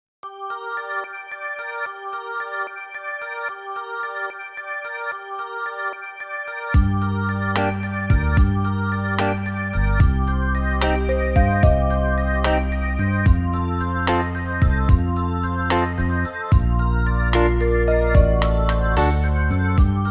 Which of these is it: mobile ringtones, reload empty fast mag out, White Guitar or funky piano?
White Guitar